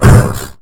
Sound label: sleep pain; sleeper